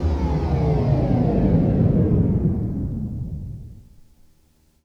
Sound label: Power